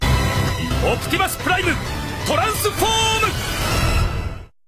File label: Commercial Bumper Sting